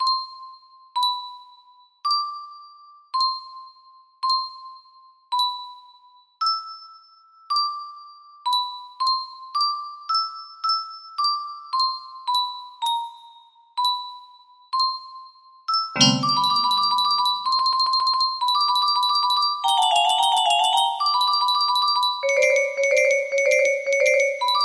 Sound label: music box